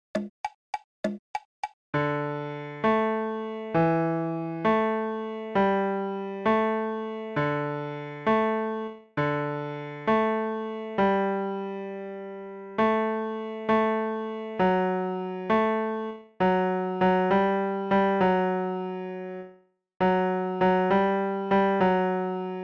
Bear Went Over left

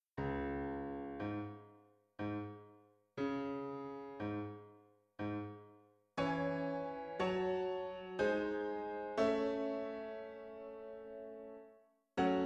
Piano

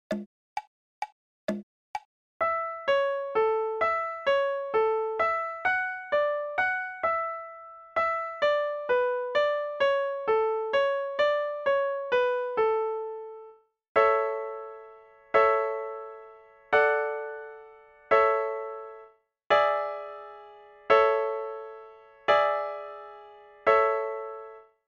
Good Morning In A RH

